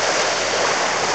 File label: SEA; M8; CMI Factory